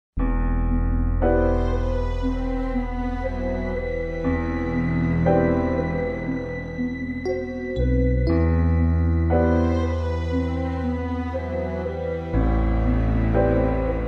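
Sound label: criminal music without words for background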